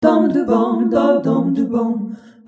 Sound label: 98 Jazzy Harmony